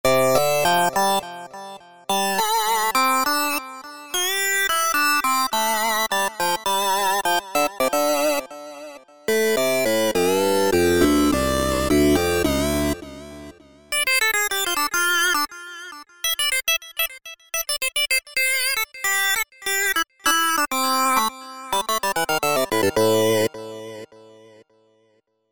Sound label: SYNTH LEADS Riffy Fifths; Apple GarageBand 2